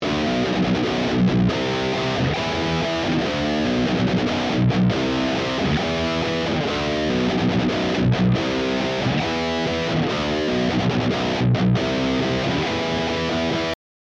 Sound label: Double Track Example One Guitar